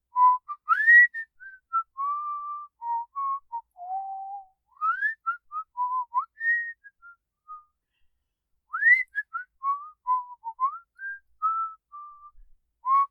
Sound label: Me Whistling